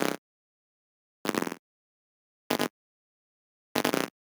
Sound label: Arcade Click Negative